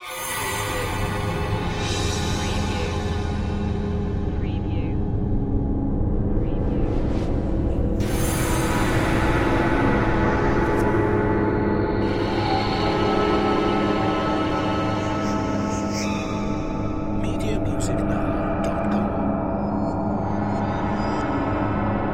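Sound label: Production Element Bed Haunting; Sound Effects Bible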